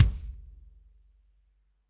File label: KICK ONE SHOT; ROBMIX